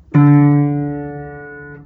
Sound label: note piano